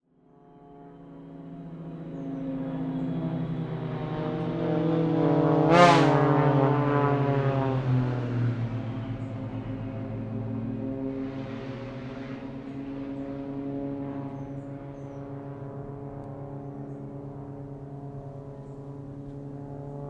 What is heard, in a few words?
aircraft extra flugzeugbau ea300 x4 airshow acrobatics close by